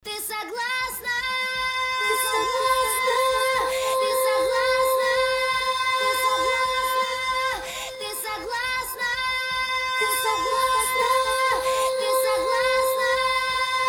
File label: chorus